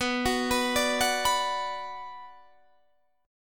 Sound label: F sharp Suspended 4th Sharp 5th B